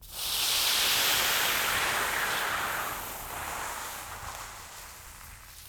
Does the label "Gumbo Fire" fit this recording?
Yes